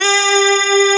vax synth; East West; Global Sounds

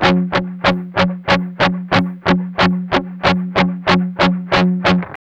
Guitars, MED ROCK; Spectrasonics; Hans Zimmer Guitars VOL-1; 102-MED ROCK

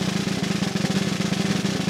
CR, Vintage Snare Drum; Q Up Arts; Johnny.C's Vintage Snare Drum

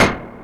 Lofi Noise; Zero-G; Soundclash; Acidized Wavs

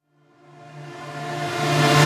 Reverse Synths Dmin, Effects; Vengeance - Essential Clubsounds Vol.4

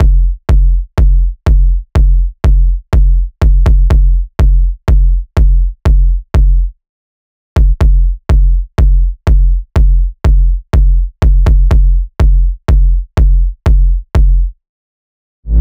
loop kick 123; Seismic Loops

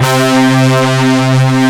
BRASS C2; AKAI S-Series CD-ROM Sound Library VOL-2

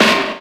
Snare, Percussion Hits, Snares

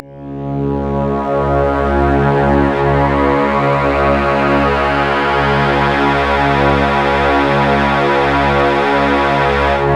C#, Synclav FM; Big Fish Audio; SPACE2

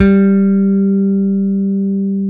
BS P BASS; Roland L-CD701; Jazz Bass

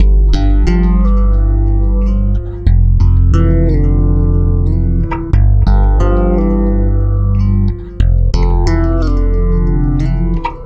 Stick Loop, Stick Bass

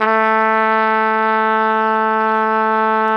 Flugelhorn; Roland L-CDX-03 Disk 2

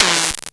Percussive, Drums Perc One Shots

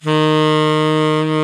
TENOR SAX D#

